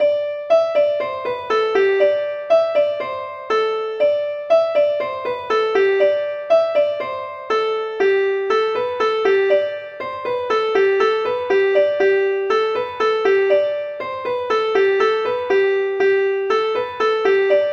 suite larides anciens

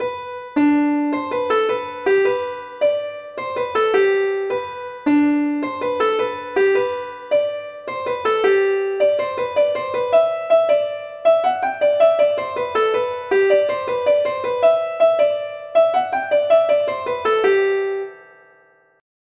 derobee guingamp

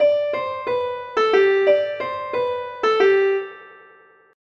trois p'tits marins

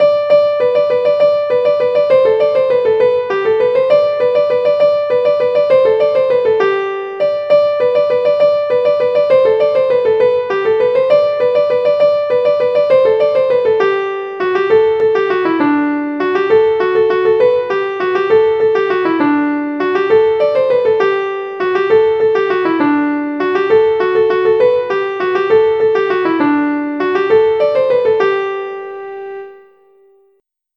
bourree; la degagee

